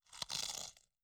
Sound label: bite long; angband